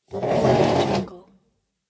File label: move chair; studio-j